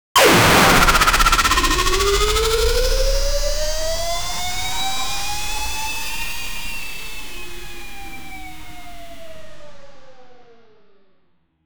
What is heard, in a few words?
sound of alien si fi, blaster